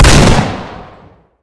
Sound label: pistol fire alt; svencoop; rngremake